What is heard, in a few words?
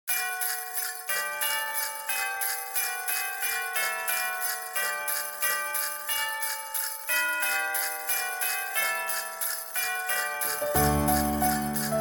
Jingle Bells instrumental